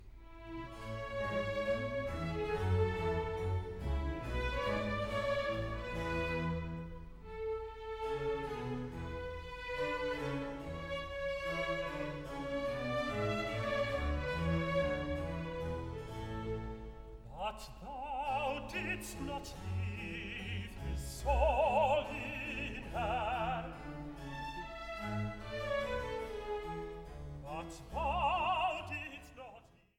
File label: tenor; part 2, no 32, but thou didst not leave his soul in hell